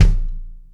Kick Shard, Processed Drumkits; Sonic Foundry; Zero Gravity Beats; Shards

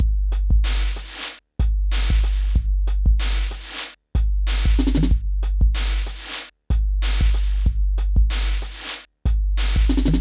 PHATT BEA

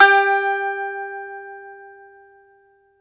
BAGLAMA, Plucked Strings

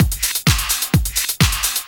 Clubhouse Loop 128 BPM; Best Service; House Definition VOL-1

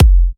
F, kicks; markus hakala kicks

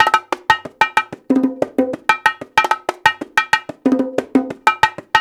Tonga Bongo

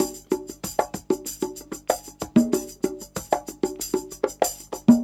Percussion, R&B Drums; Sonic Foundry (Sony Creative Software)

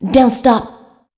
PHRASE, Voice Spectral

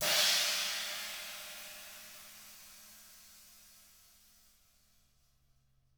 SWISH RIDE 21; ILIO; Double Platinum Drums 1

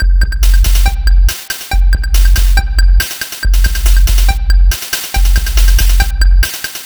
Cheepo Groove 140, Lounge Drumz

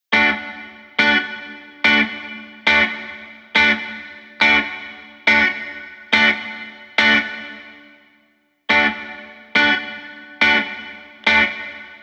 140BPM Dmaj Guitar FX, Offbeat Dub Guitars; Antidote Advent

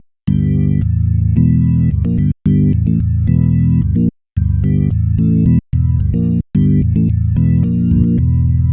Bass Line; Eclectic Electronica

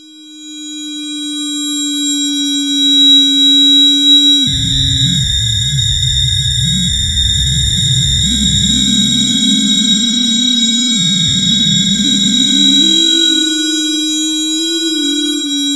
METAL DRONE; Zero-G Ambient 1; METAL DRONES

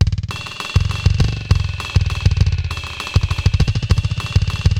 Digital Delay RX17 (100BPM), cyborg beats

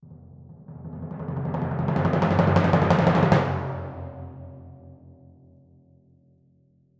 Timpani Roll; Orchestral; Percussion One Shots; Sonic Foundry (Sony Creative Software); Orchestral Series VOL-4 - Rock and Pop

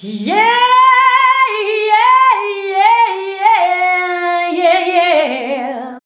VOX, Singin

